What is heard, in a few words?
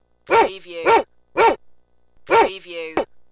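Big Dog Barking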